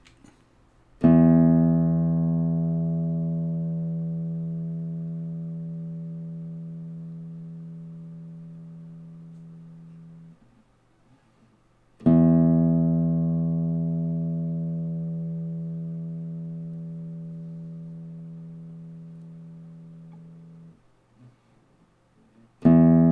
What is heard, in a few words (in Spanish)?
Sonido de guitarra española cuerda 6 Mi E; afinar una guitarra